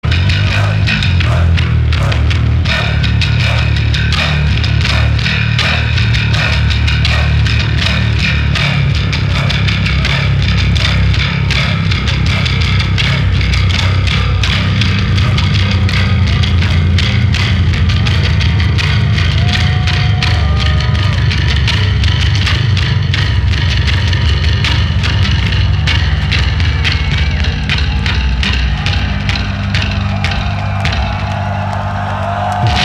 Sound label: Bass Solo